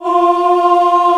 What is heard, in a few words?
Choir, VOICE; Best Service Gigapack 1